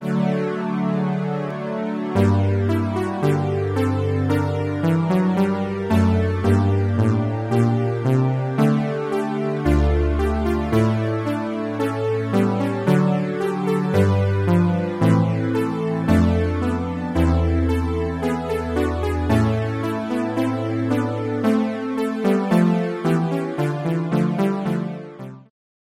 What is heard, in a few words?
roland sc-88 velo brass